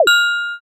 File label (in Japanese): shishiodoshi